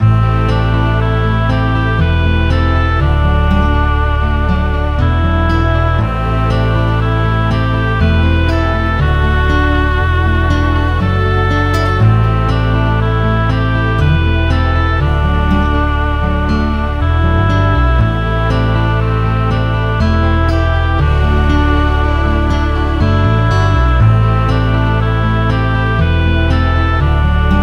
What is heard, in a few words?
shrine; msx; mog